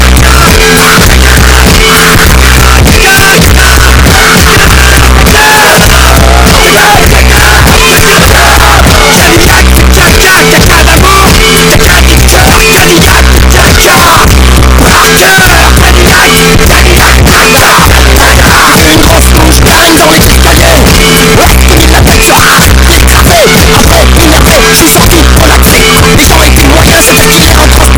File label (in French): Cactus screamer musique